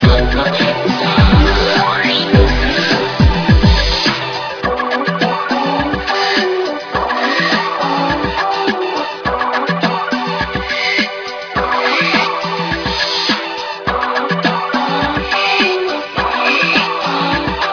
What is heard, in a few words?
chill out